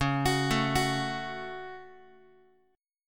Db Suspended 4th Sharp 5th Guitar 4A,4E,5A,5D,5G sharp,6C sharp; arpeggiated; JGuitar